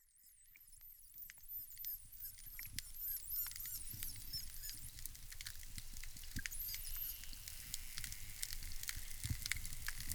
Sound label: Mary River sample